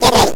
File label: Explosion; SNES; Bioworm